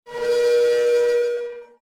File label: destructive metallic scrapes